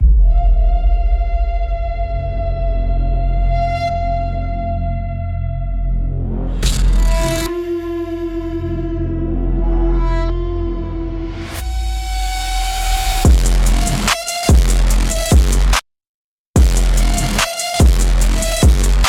Shatter Pulse, aggressive panic for direct fear and jump